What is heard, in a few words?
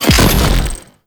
Wall Eye Explosion; Warframe; Sentient Devourer; Ambience